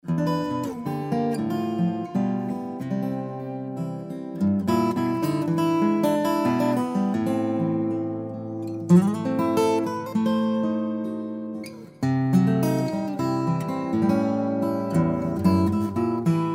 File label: spanish cocktail